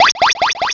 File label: Ally Switch; pokerogue; battle anims